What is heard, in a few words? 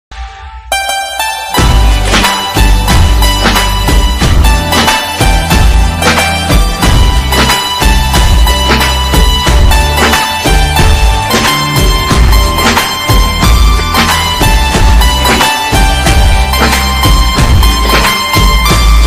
BGM ringtone